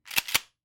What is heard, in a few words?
Shotgun Racking; SoundsCrate